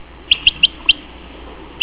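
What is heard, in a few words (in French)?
bécasseau sanderling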